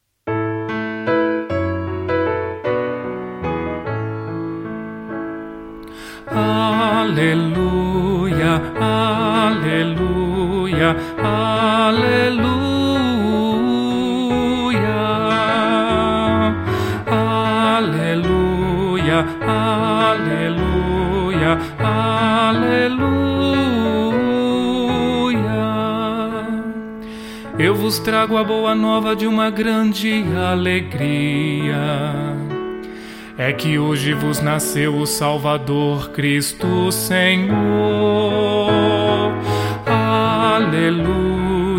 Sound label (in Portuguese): aleluia natal missa da noite; solenidade do natal do senhor 2020 ano b noite